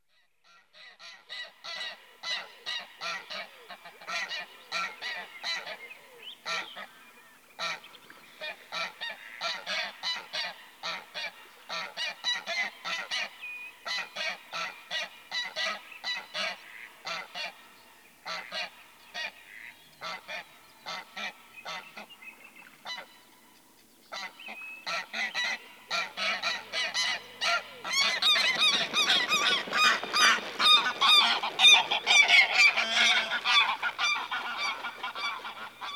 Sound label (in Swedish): ljud gragas